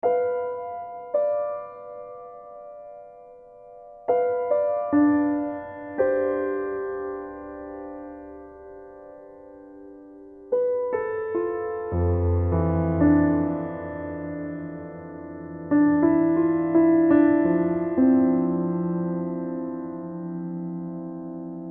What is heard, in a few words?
Piano